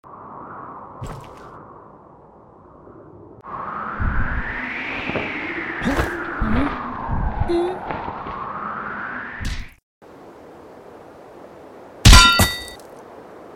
Parachute; The Henry Stickmin Collection

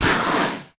Footstep Skid

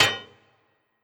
Throw Impact; Legend of Keepers; Mecano

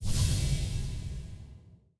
Dream Ghost Appear; Hollow Knight

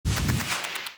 Hero Super Dash Charge

